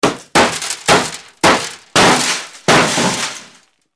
Bullet Impacts Metal

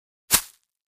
Foliage Impact Soft; hits & impacts

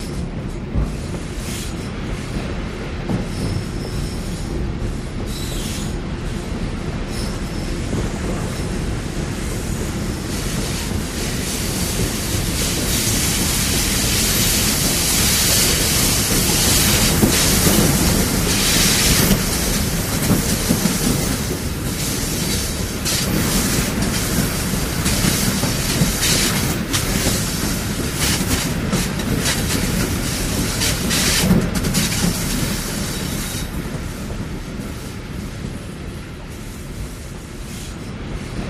Diesel Locomotive, switches

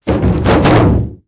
Body Falls Car Hood

